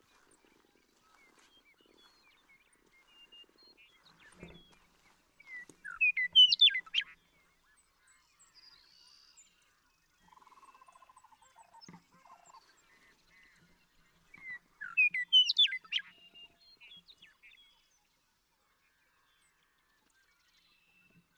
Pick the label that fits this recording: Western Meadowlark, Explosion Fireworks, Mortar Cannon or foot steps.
Western Meadowlark